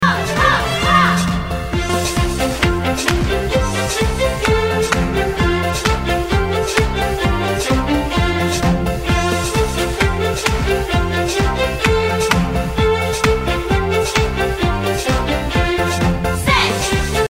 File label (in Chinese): 擊掌練習